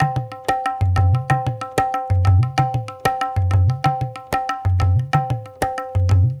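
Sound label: 93 bpm, tablas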